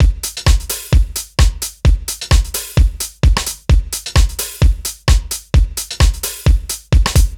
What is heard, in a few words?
Solid Loop 130, Loungin House; Equipped Music